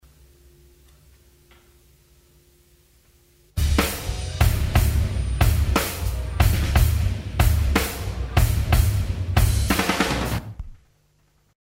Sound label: Drum outtakes